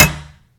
Glass Rubber, Collision Sounds; clockwerk opensim

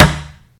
Rubber Rubber, Collision Sounds; clockwerk opensim

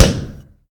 Flesh Stone, Collision Sounds; clockwerk opensim

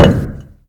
Stone Wood, collision sounds; clockwerk opensim

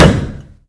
Stone Rubber, Collision Sounds; clockwerk opensim

